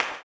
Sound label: CLAP, Blues Soul; Spectrasonics Vocal Planet